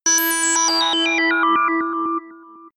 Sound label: sci-fi dark planet, digital